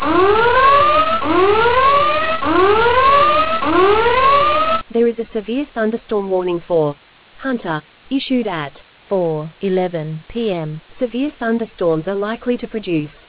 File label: storm audio